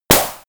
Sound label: slapping impact, Metall; hits explosionen